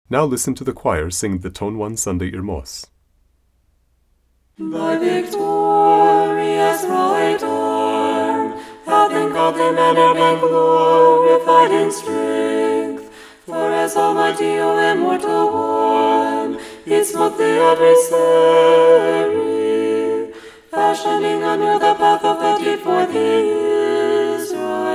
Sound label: Irmos Sunday Irmos (Choir); Tone 1